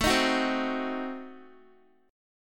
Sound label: A Diminished 7th, Guitar; Adim7; strummed; chordshape; x,12,13,11,12,x